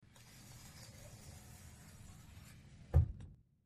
Closet Door, Metallic Sliding Along Rail And Close